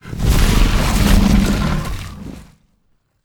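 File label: Hydra voice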